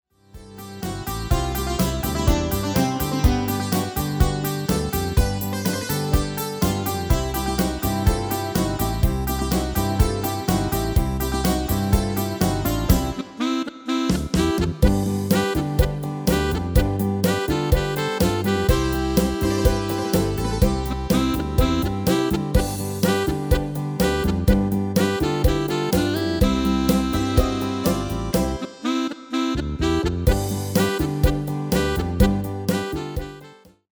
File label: MIDI